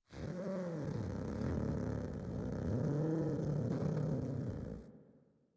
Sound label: Kavat Vocal Idle; Warframe; Khora